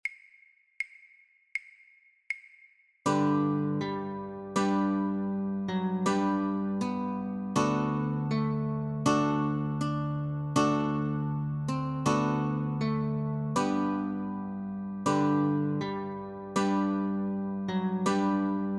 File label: Notes & Chor, guitar; christmas